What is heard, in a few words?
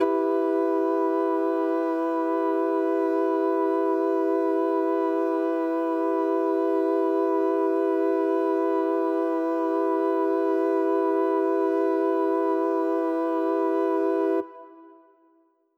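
Mixed Winds E minor, synth orchestra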